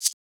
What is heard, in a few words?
Shaker; FLPRO